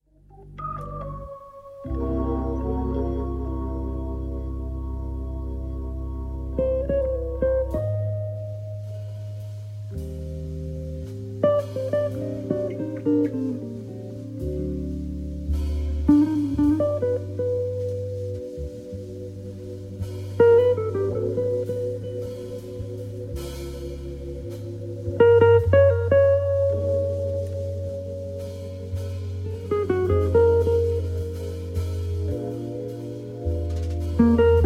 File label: trio, organ